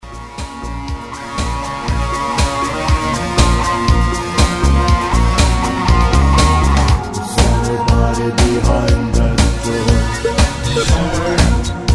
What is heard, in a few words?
Pop